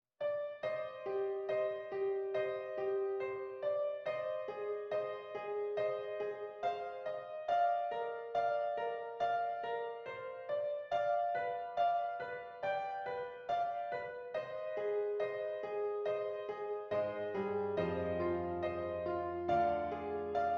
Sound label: Piano Solo